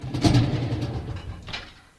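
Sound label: sliding shelf close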